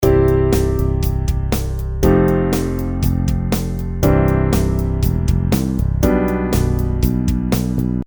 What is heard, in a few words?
Shift Bass Note